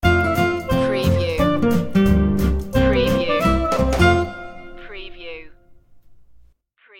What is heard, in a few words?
swing sting